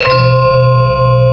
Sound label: gamelan; tumbledemerald